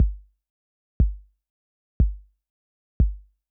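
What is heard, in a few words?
808 bass drum; hydrogen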